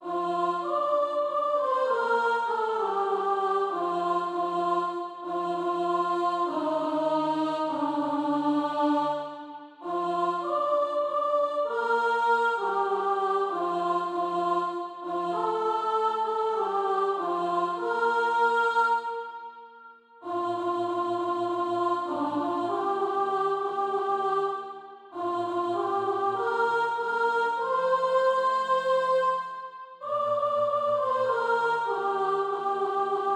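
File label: soprano, SATB Practice Music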